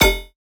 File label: Bass Stab Stinger F